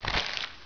crump

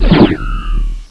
Laser Shot; Gunlok

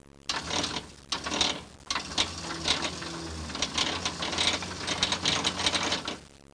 reel in, fire; disaster

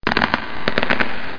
studs

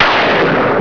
lighning

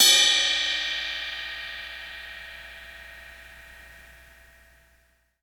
Ride Bell; Rock; Drums; One Shots

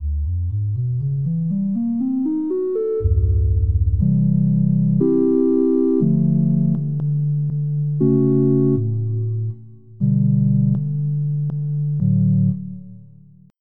Ocarina; sblive

